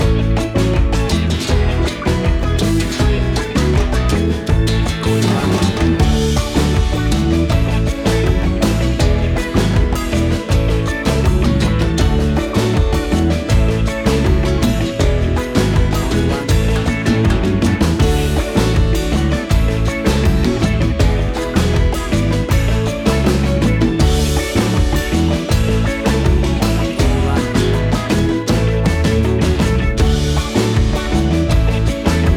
Calypso Island Summer Groove

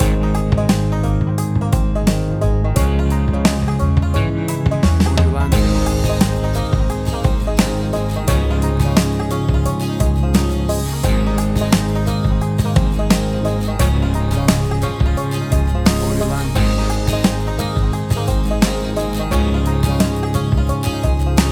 Bumping Country Jive

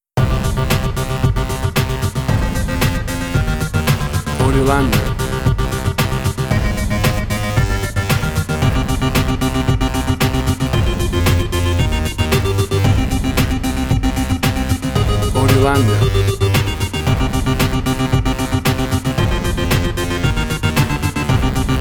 Electro Computer; Fchiang

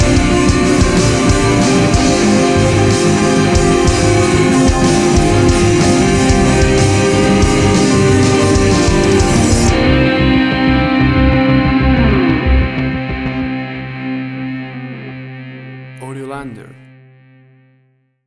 Action Hybrid Dramatic Trailer Music

